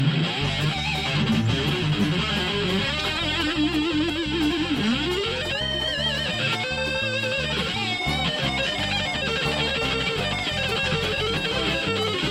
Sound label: Solo